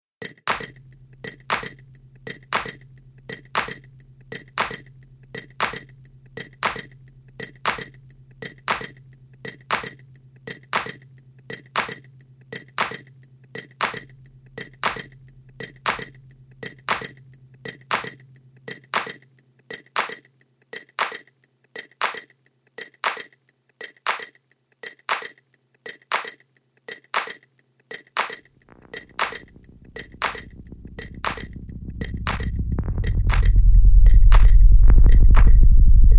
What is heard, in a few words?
Noise